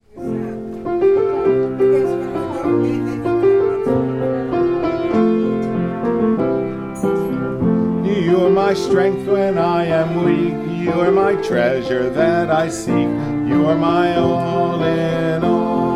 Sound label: Tenor; choir